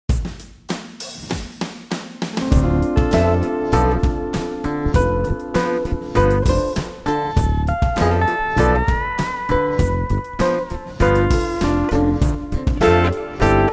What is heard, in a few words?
Spooked Uke